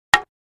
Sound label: 2x4 Impact, hits